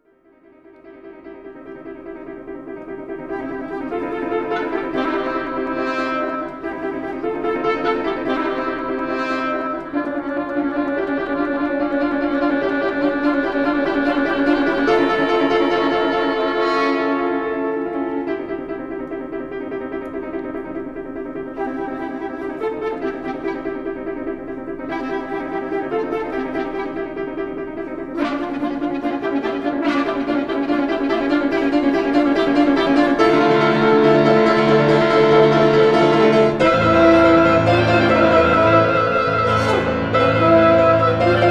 piano

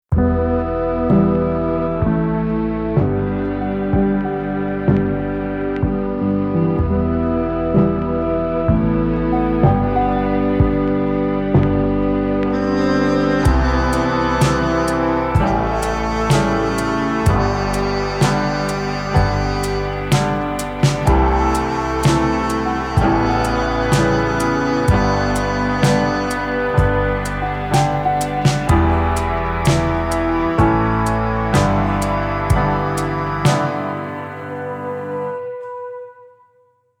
DARK COMEDY INDIE

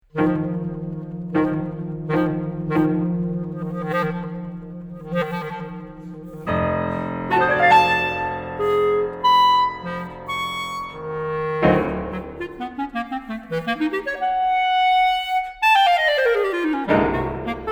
Clarinet Sonata Dramatic and driving

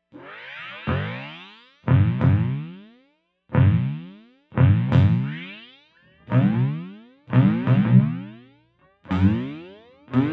Fender Bass Battle Drums; EQD

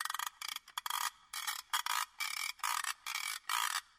BALLROOM NOISEMAKERS

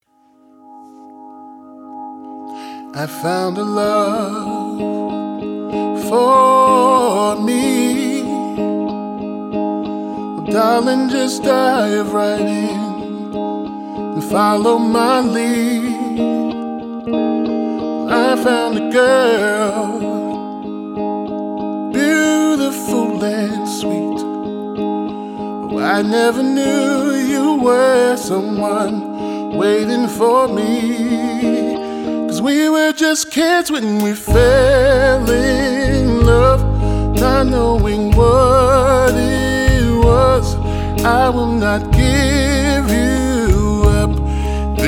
Duet

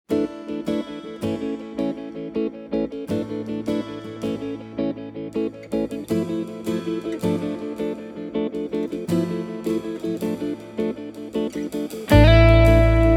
Electric Island

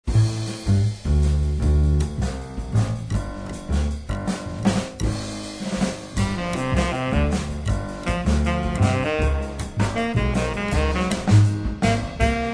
Funk

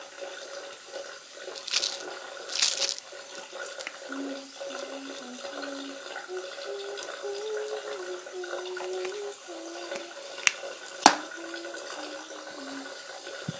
Filling cup of water while singing

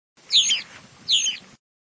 Black Rosy Finch

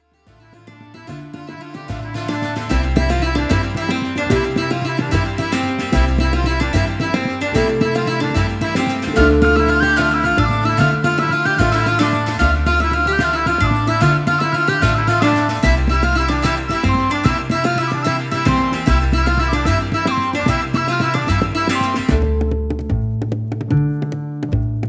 Folk World Reel

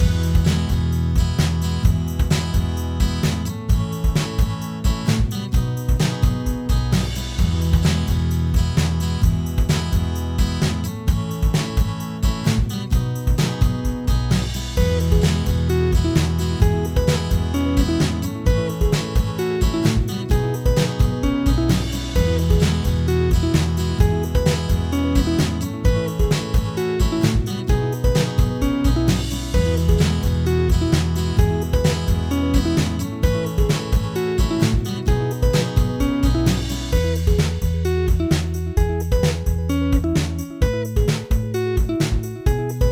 Song Loop; LocoLoco Song 1

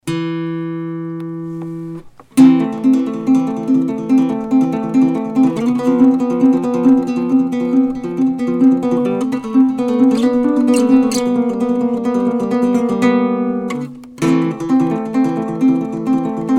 Silvertone

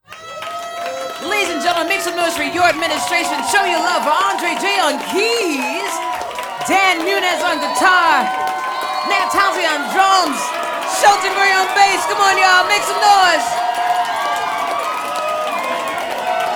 Groove interlude

